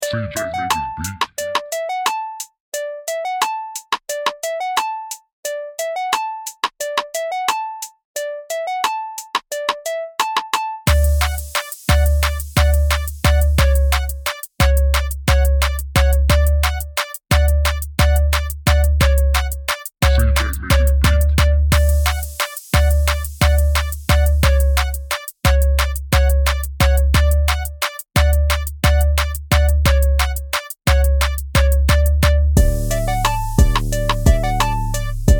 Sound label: D Major Beat